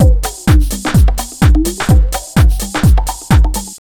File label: BEAT, Disco House; USB Soundscan vol.20; AKAI